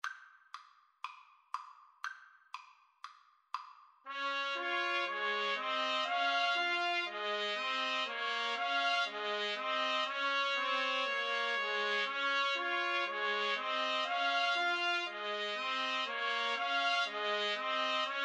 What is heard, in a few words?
3TPT, Trumpet Trio